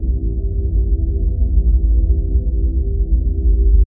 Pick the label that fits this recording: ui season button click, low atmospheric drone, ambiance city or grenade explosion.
low atmospheric drone